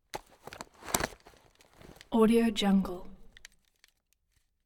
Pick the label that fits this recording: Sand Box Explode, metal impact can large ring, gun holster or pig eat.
gun holster